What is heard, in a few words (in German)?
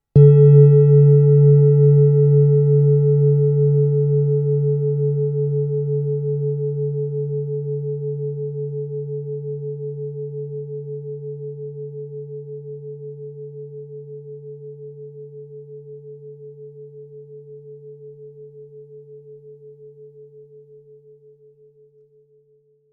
klangschale merkur 1473g